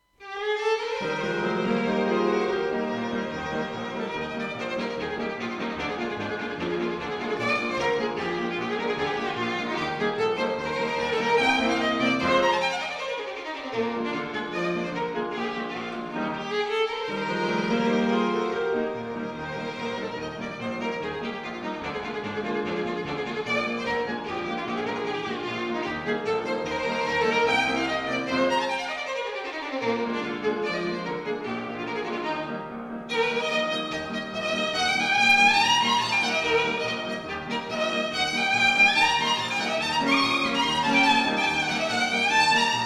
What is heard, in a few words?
hora staccato